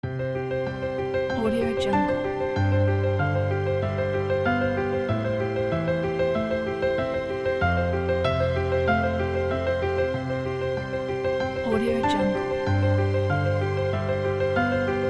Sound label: motivational uplifting emotional piano story; viktorse